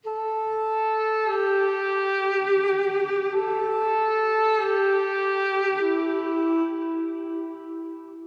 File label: Duduk, Am, 145bpm; Little Ethnic Vol.1